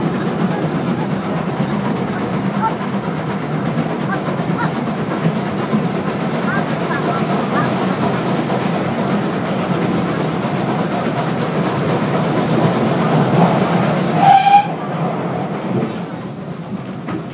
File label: Train love and arrival at Churston. even singing!